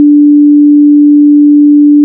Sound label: wavy